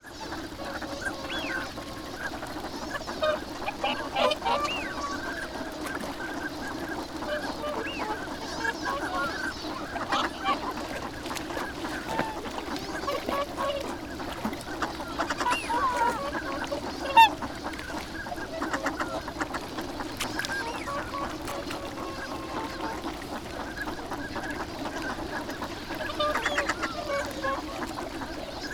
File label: abukuma duck